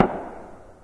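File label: distant gun; random amb sounds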